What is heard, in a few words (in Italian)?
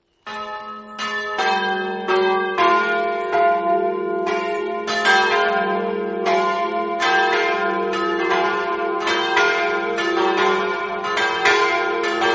poggio, campane